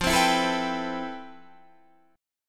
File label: F Major Sharp 11th Guitar DADGAD, chord